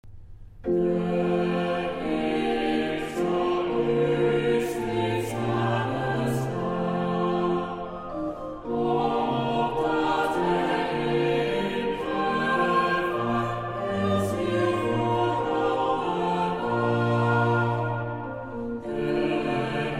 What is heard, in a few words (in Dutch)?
Psalm vocaal